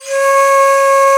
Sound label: SOPR. BON, India Winds; E-MU Producer Series Vol. 7 – Old World Instruments